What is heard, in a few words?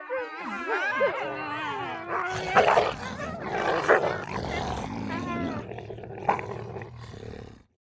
Pack of wolves fighting